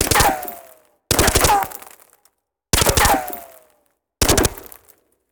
Bullet Ricochet; Sound Ideas; Guns

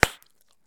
Bubblegum Pop; SFX Kit; Foley - Misc

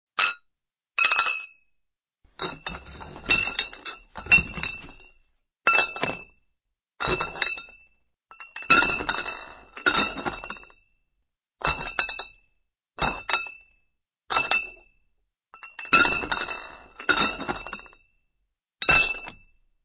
Beer rattle; RadwinIsland

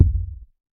Impact Synth Low Sweetener; Blind Drive

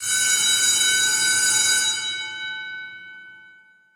Bell Factory Ring; SFX Kit; Foley - Misc

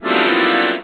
Cartoon Accordion Squeeze; SFX Kit; Foley - Misc

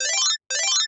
phone ringtone; NS Studios - ultra sound collection

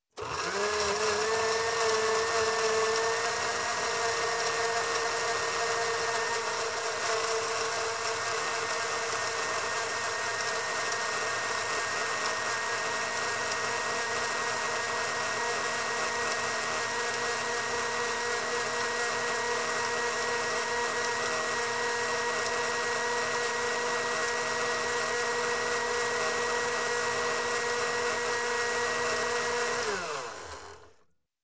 Blender, Electric Start, Run, Stop, Liquid; Sound Ideas 2000 Series

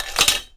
Hacksaw Metal Drop; SFX Kit; Foley - Misc

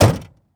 Semi Truck Door; Large Vehicles; Blastwave

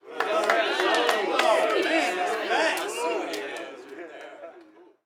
street ball crowd cheer low; SFX Kit; Crowds

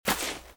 grass; firefight

